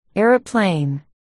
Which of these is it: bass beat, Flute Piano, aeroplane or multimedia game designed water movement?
aeroplane